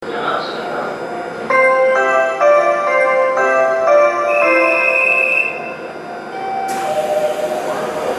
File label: melody; nishitetsu futsukaichi; n futsukaichi1; 発車メロディー; １番のりば